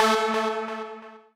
lead a3

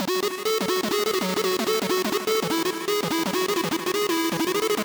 sub lead bg

